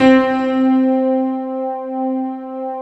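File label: island piano C3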